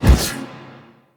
Iron Eruption Blast Cast; Warframes

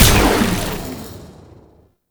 Operators Amp Chassis Part C Fire; Warframe; Amplifiers

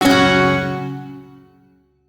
Shawzin Chromatic Chord; Warframe; Duviri

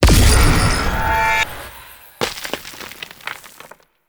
Ground Blast; Duviri; Incarnon Okina Prime; Weapons

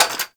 Cafe Chair Short; Warframe; Nineteen Ninety Nine

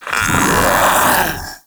Infested Splitter Joined Vocal Alerted; Grey Strain

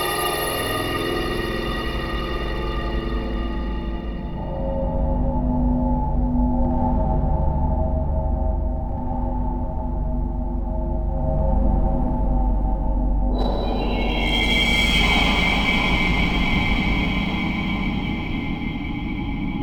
Bed Loop, Interior; Warframe; Shadowgrapher

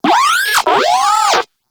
Moa Compassionate Vocal Enemy Killed; Warframe

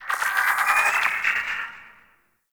Ship Mechanical Textures; Warframe; Corpus Ship Remaster

